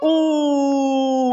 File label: "ooh" male